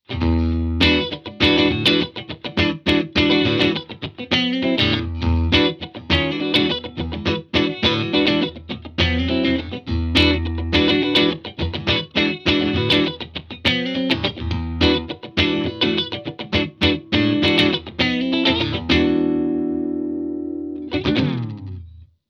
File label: Blackstar St. James Comb DI Stereo; Architect Software